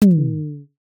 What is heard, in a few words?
facepalm wood